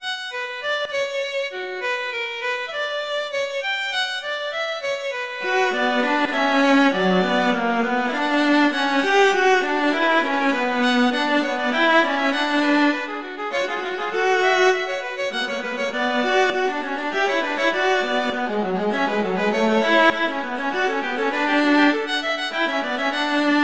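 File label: Vivace moderato, violin viola; Telemann; Canonic; Sonata 4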